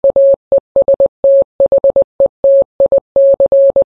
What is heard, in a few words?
morse